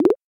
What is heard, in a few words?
simple pops